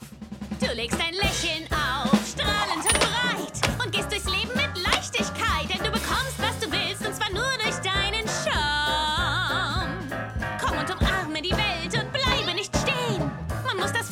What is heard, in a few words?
song; The Cuphead Show